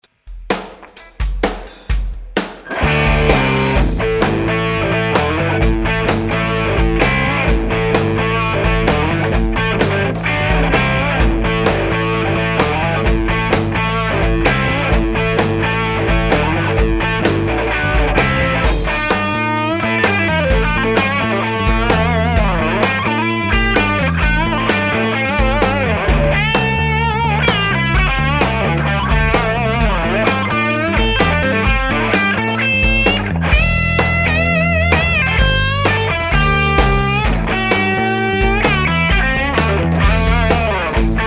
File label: highgain bridge; schwarzbach; HB59-CreamGen